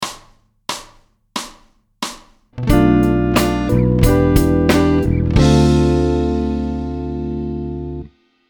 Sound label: chord